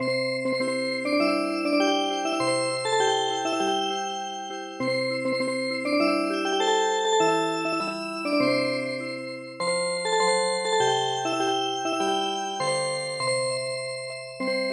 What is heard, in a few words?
Music Box